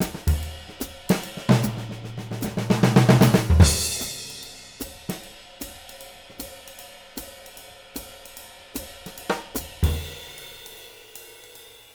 SWING, Living Drums

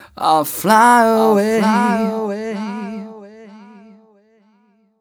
m 129 mel e, Vocals

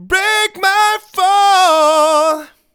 Break My Fall, Vocal Phrases; Ultimate Vocals

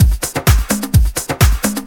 Discohouse Loop 128 BPM

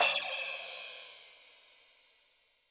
percussion, Roland TD-20

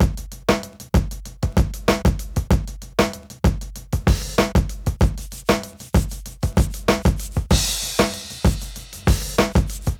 SUNRISE 96; AMG Gota Yashiki Groove Activator; GOTA GROOVES

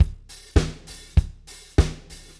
disco 100, Beat Loops; Zero-G; Monster Beats

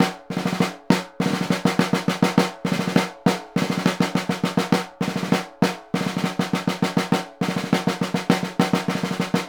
SNR, Synclavier Percussion 2 - World & Orchestral

